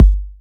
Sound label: Bassdrum; Vengeance Samples